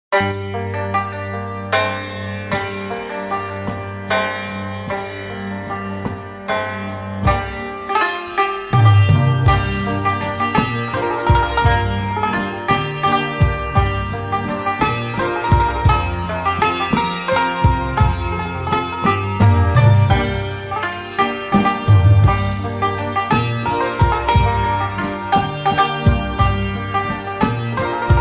Santur

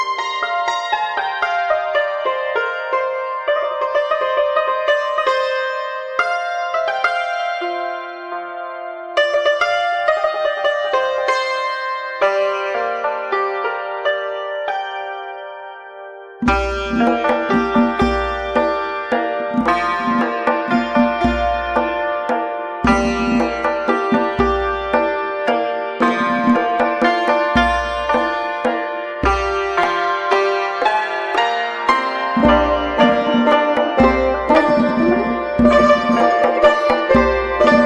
Tar Santur

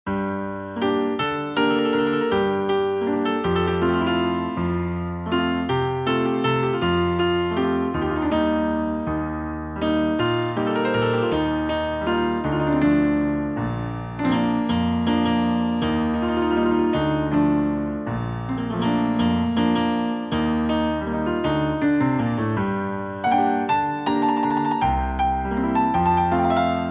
Piano

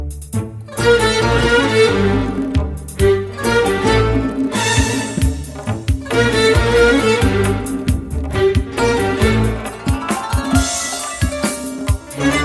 Accordion, play back

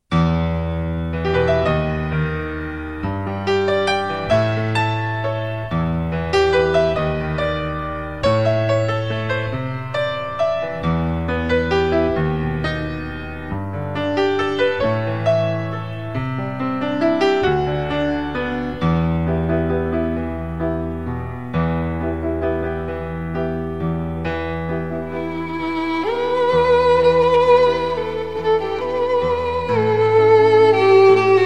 Violin

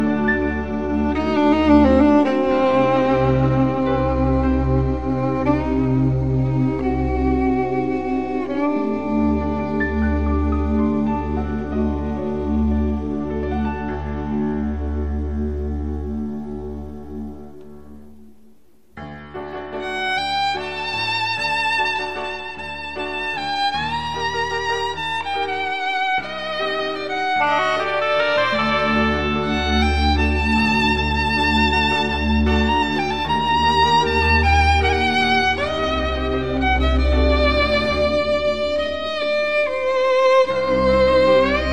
Violin

